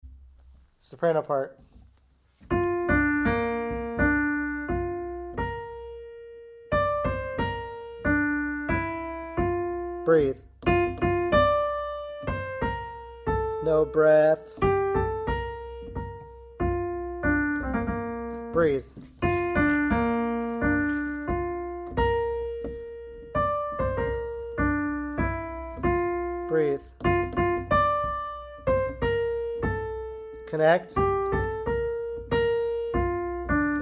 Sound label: star spangled banner soprano